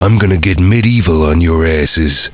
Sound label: death; quake2; duke3dmodel